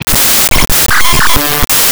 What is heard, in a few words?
125 c, guitar licks; PS-28 Vocal House Samples; instrumentloops